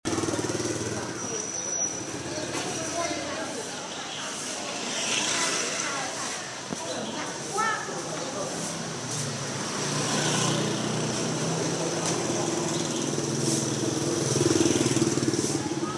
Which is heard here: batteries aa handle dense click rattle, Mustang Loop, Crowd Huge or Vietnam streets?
Vietnam streets